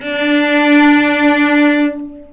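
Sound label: cellos attack D4 L, String; East West; The Ultimate String Collection; VCS ARCO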